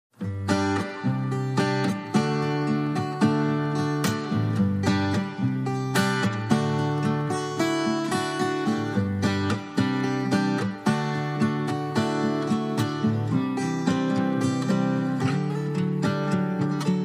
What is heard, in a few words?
Acoustic Guitars Only